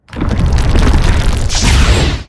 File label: arcane golem, monster